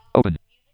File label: Folder Opened; ESAN VO Sound Schemes; ESan Eloquence VO Sounds Pack